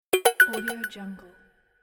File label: message notify